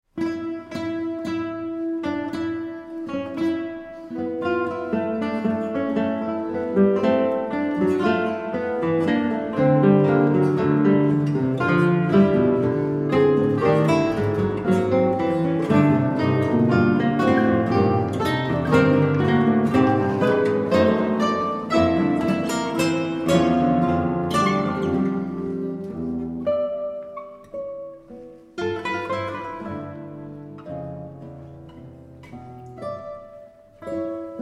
concerto for four guitars and guitar orchestra allegro spiritoso